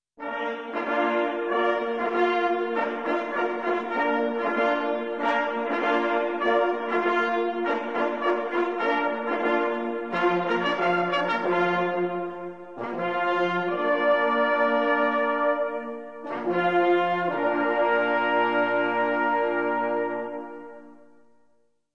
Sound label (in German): rehtot, signale